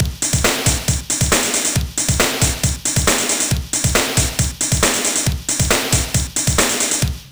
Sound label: Dynamic Drums Pattern; Oakvalley